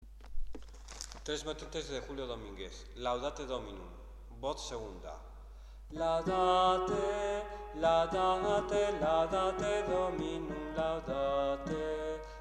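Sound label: soprano; 3 motetes; laudate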